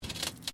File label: METAL SCRAPE SMALL; Sound Effects:Materials